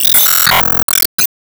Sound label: Enemy Hourgrass Active Hit